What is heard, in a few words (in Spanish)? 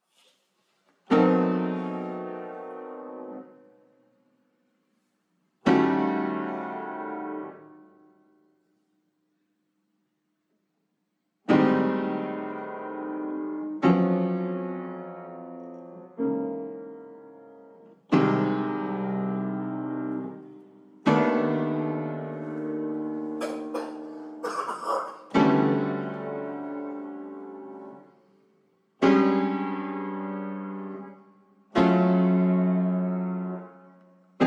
piano